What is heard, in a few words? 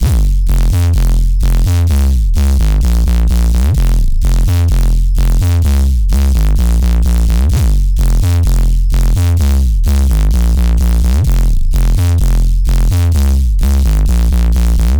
128 BPM F Min Bass; House; Drop Loops; Cymatics; Theater